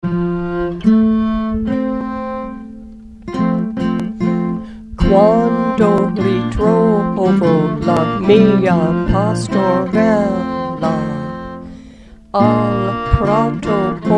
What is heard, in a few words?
Alto Wds; Quando ritrovo